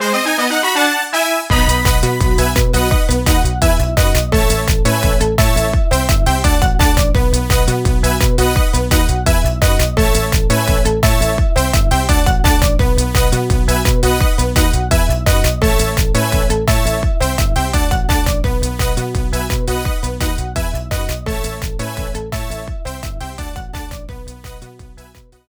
BGM Home Run; Clubhouse Games: 51 Worldwide Classics

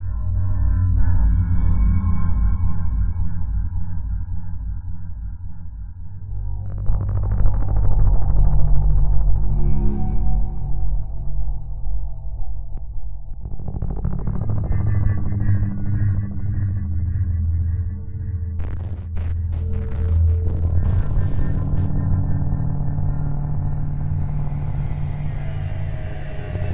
Underwater Drone; deep black 2012

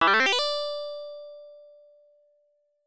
Credit SFX; Flying Tiger

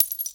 Panel Reassemble Pickup; Among Us

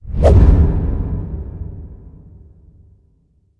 Dialogue Start; helltaker

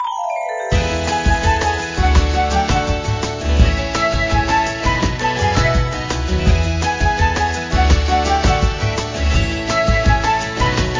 bgm guild battle box gacha intro

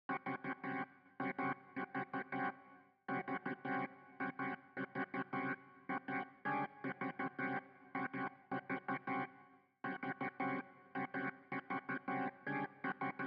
Synths & Keys

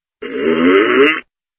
SFX sound (baron power hit)

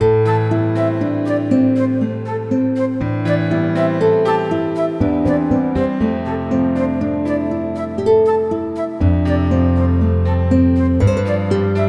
Lose; Gensokyo Defenders 2018 Switch

